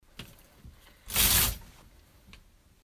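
peredvigaem veshi v shkafu, moving things in a cupboard; Звуки куртки, штанов и вещей в шкафу на вешалке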